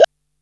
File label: DR-660 Perc, Drums, Boss DR-660